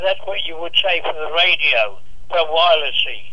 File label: Radio